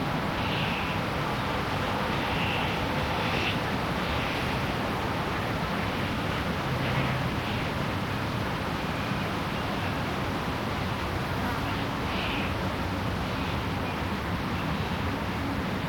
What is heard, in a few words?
Ambience Randstad